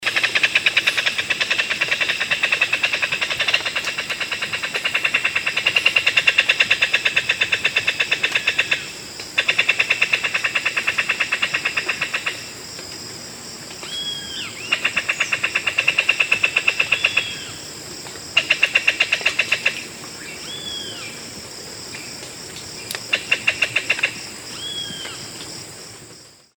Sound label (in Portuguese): martim pescador grande